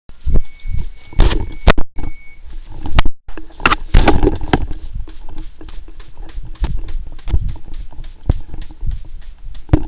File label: lego sound